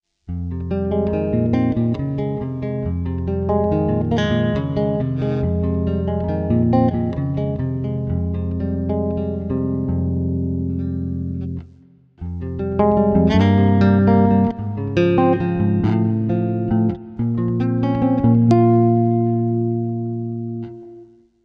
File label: bass solo